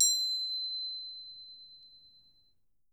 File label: Percussion B4, Harp; Miroslav Vitous - Percussion & Harp